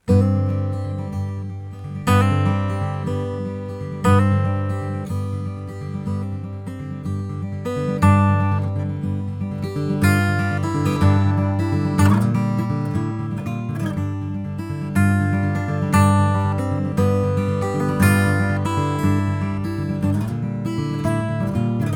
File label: Acoustic FET Stereo